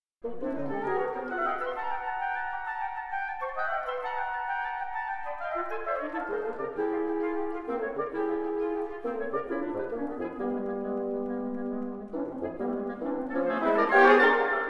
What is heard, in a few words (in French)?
Musique pour vents Quintette